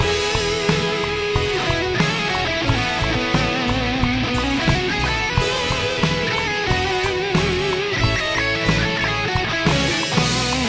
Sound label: Party Time Music Guitar Loop